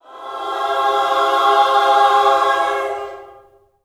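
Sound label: JOY C major, Vocal Gospel; Spectrasonics; Vocal Planet Vol.1 Gospel; akai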